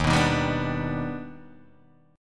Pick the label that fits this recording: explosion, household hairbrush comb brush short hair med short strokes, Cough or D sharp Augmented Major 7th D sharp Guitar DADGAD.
D sharp Augmented Major 7th D sharp Guitar DADGAD